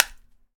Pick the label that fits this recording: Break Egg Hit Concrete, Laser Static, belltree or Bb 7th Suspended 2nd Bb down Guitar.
Break Egg Hit Concrete